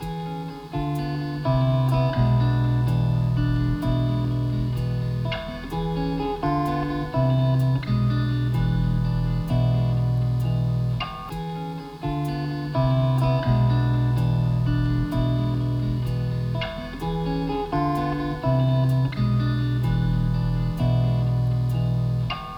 Wah Wah; Lincoln

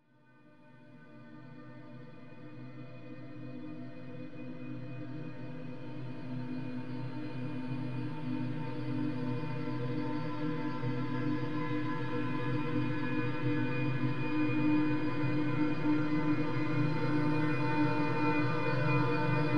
gtr FX

